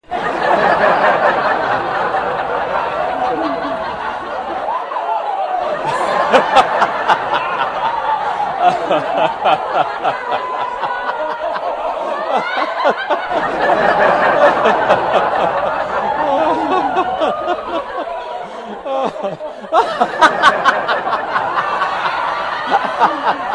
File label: laughing